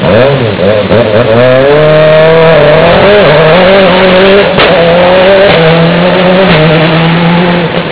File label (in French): moteur